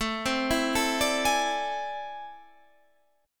A Minor Major 11th, down, Guitar, Standard; arpeggiated; AmM11; JGuitar

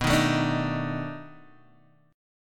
B 7th Sharp 9th B down Guitar Standard; B7#9 strummed; chord shape; JGuitar

